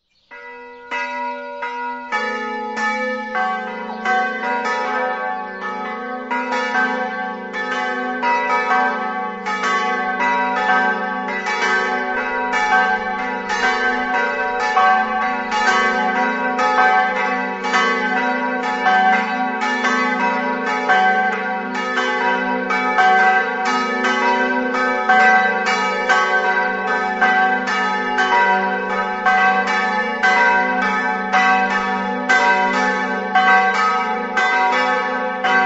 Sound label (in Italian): smartino, campane